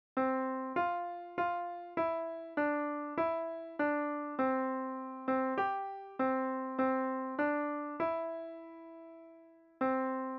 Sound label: hymn melody